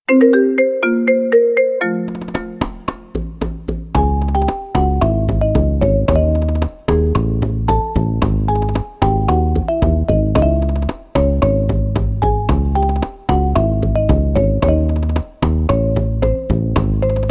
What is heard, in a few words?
Marimba; Narcos